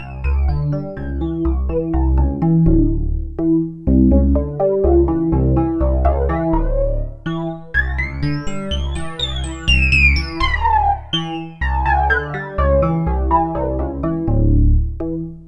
synth arp; Electrocution

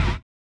collision; Spectrasonics; Metamorphosis

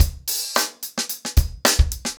110BPM, acoustic drums; The Roots Drumloops

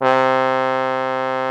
TROMB C2, MONO BRASS; Greytsounds VOL-1

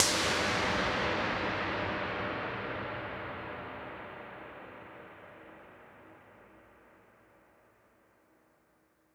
Noise Verb Decays; stereo toolkit samples

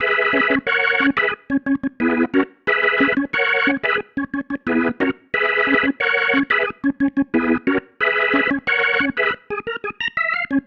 Pattern, Organ

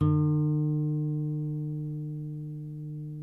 NYL NV E, nylon no vib guitars; Spectrasonics; Hans Zimmer Guitars VOL-1

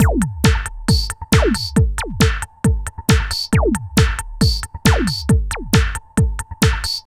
LOOP, Tekno Industrial; East West

